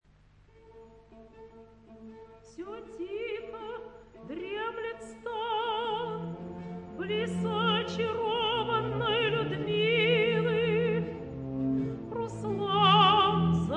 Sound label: recitat; glinka; ruslan e ludmilla